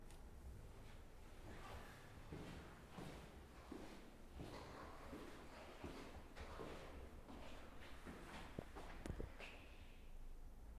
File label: Footsteps In An Empty Room